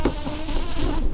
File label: Lapidarius queens, bumblebees